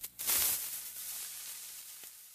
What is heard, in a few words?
tnt charge; smbx2; Redstone Test World